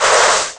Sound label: explo, Amiga Soundtracker Sample; ST-XX Amiga Soundtracker Sample Packs